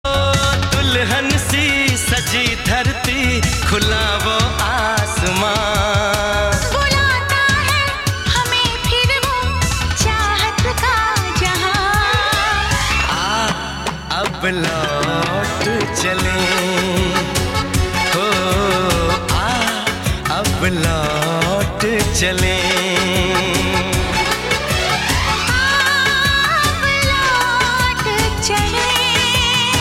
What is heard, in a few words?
Song Ringtone